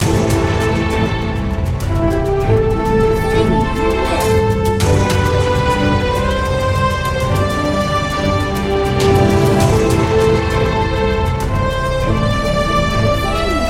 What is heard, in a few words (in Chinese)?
创建角色